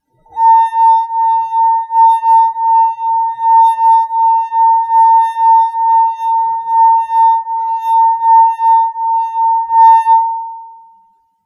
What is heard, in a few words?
Wine Glass Sing